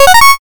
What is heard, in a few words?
start